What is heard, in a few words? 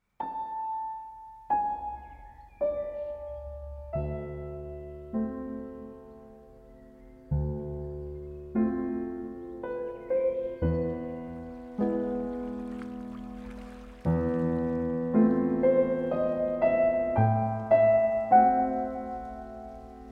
end music for piano